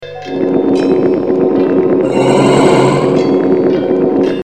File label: chest appear; Paper Mario 2 The Thousand Year Door